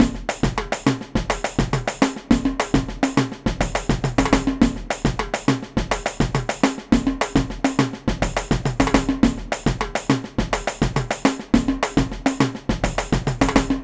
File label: Baianada, samba